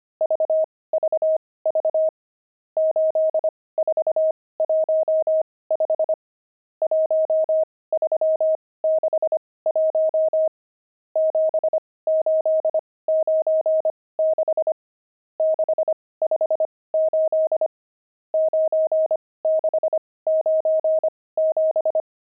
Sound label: pangram, intermediate pangrams files